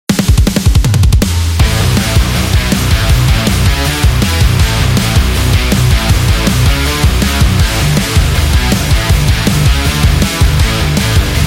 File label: Sport Metal